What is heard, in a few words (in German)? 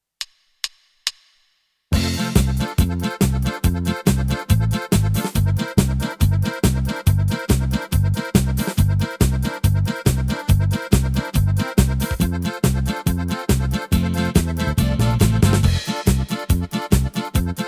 Polka ohne Melodie